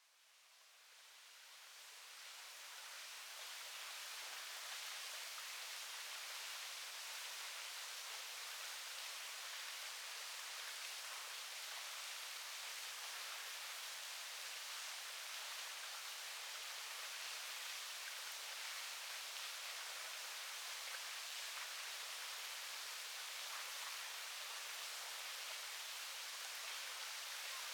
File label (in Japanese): 滝の音